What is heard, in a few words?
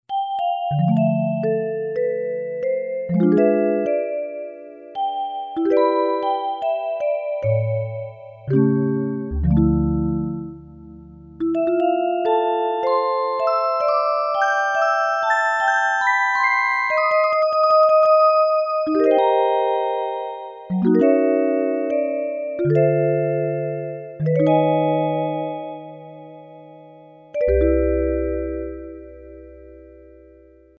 Vib, Casio CSM-1; Tones